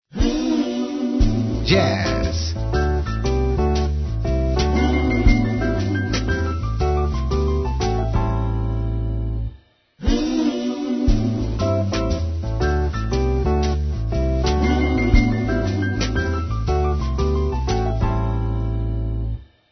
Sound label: jazz